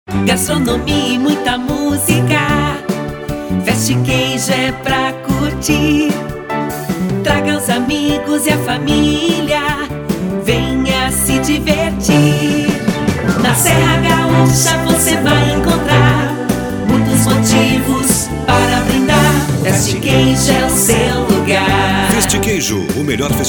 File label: festiqueijo jingle